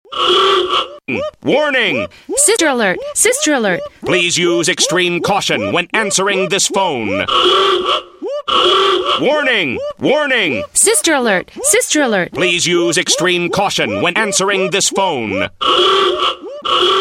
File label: alert ringtone